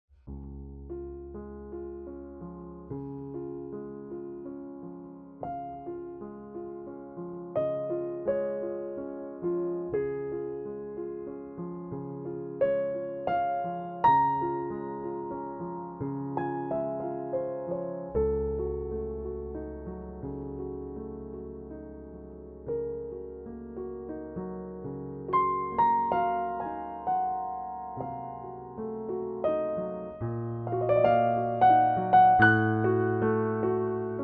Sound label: Steinway B prelude